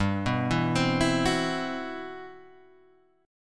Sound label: C Major Sharp 11th G